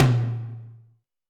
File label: Tom Lo Slam, Alesis D4